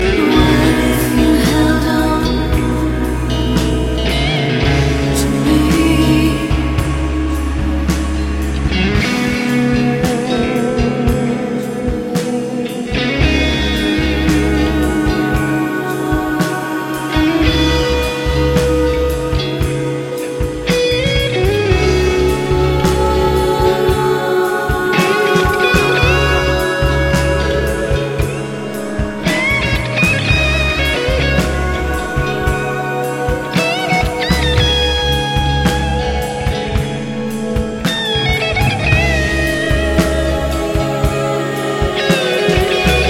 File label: guitar solo